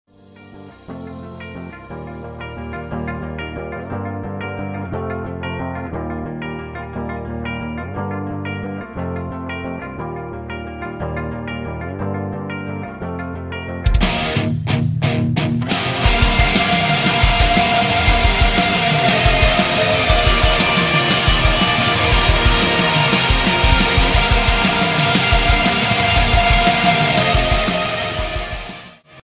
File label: Ringtone